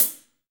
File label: HH hh; Best Service Gigapack 2